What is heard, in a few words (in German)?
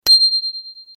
Fahrradklingel; Alice in wonderland